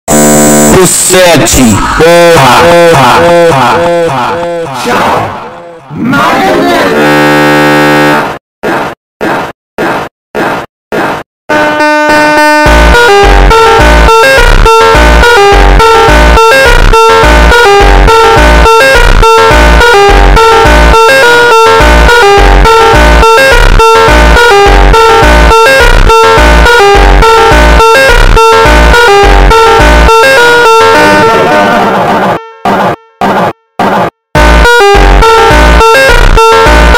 Funk, slowed

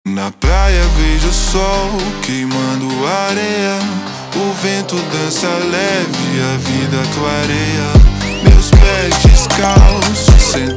Batida Jumpstyle slowed

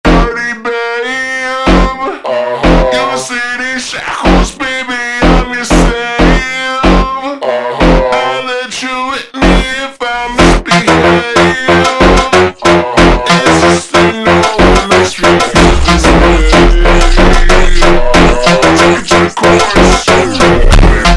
super Slowed

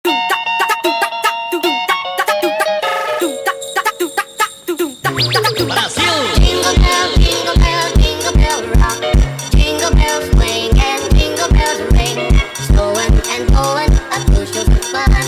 Jingle Bells Funk sped Up

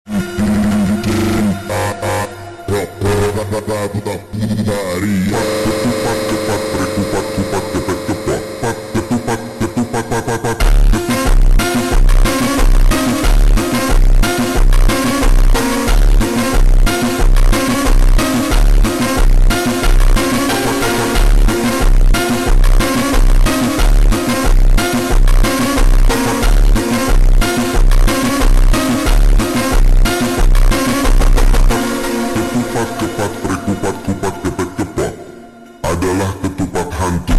ultra Slowed

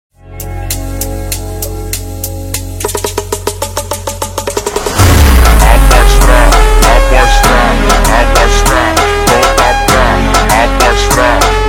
FUNK SLOWED

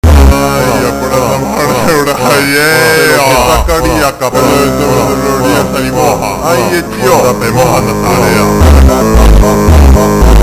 Phonk Slowed

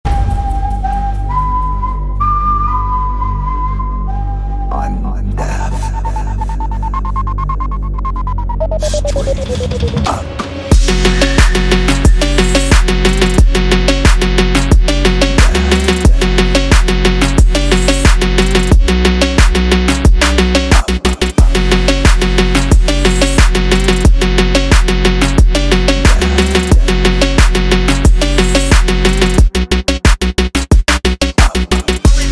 Death Wolf Phonk

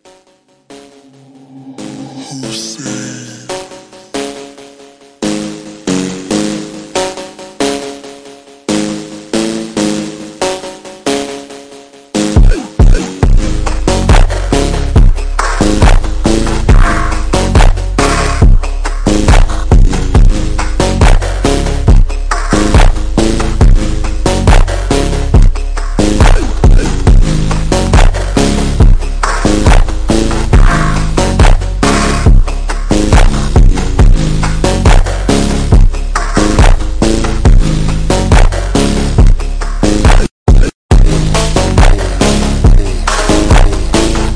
extreme Slowed

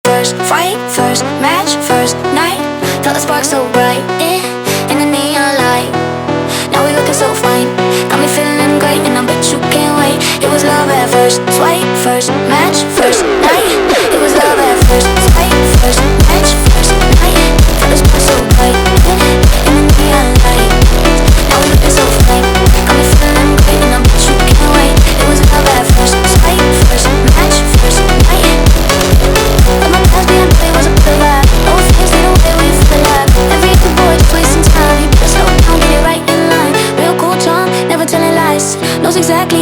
Jumpstyle

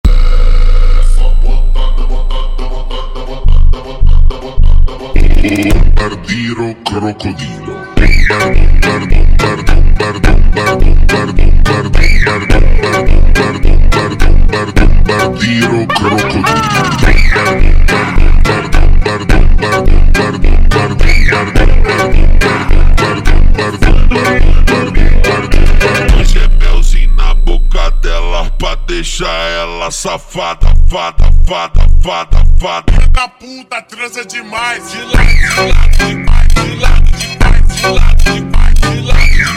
Funk slowed

